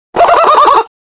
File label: goofy laugh